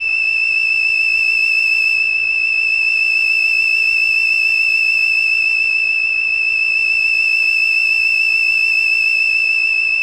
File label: White Siren R E6; pad; Kawaii